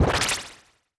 cure poison

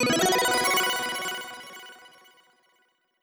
accent brilliant low

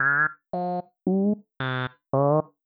synthesizer voice aiueo middle

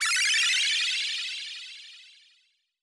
heal

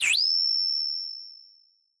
flash high

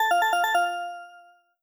correct answer

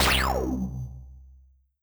evil laser

